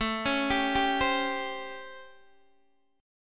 A 7th Sharp 9th, Guitar, Open D

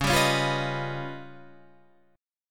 Db 9th Flat 5th Db down; strummed; JGuitar

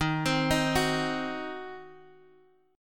Eb Minor 6th, down, Guitar, Open D

